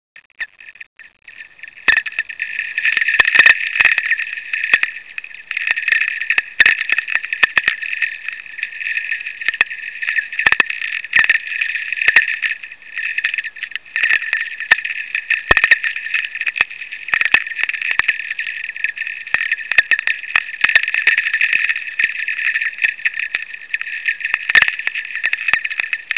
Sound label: tweeks; Natural VLF Radio Emissions